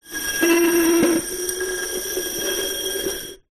household drinking glass scrape on metal draning board; zapsplat